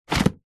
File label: household book telephone directory set down; zapsplat